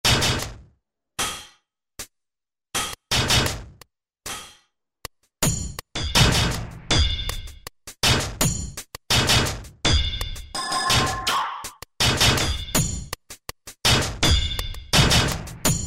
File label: Indust., Roland SR-JV80-11 Techno Collection; Indust.SET